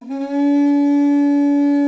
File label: Mali Horn; Civ4 warlords